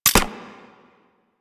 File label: Sidearm Mech; Weapons; Warframe; Tenno; Wraith Sidearm